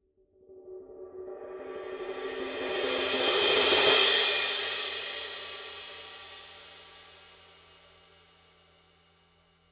signature dark energy 17 dark energy crash mark i swell